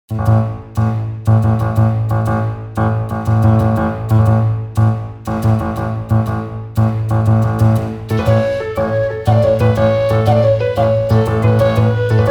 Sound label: Jig Off Vocal